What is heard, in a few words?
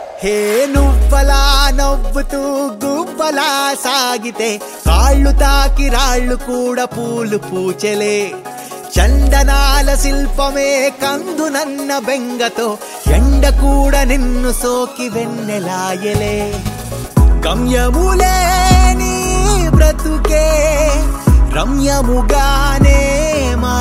new telugu ringtone